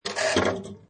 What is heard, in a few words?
Pinball Sound Crane Clank Move And Drop Ball; Video Game Sound Effects